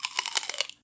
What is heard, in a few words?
apple bite